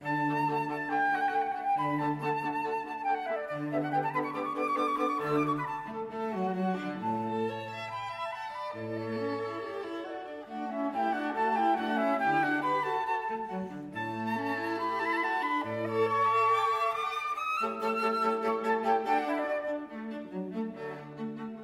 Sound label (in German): Flötenquartett D-Dur; Mozart; 1.Satz